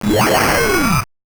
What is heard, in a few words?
Noise, 8-bit, Bitty Noises; musicradar; 8-bit bonanza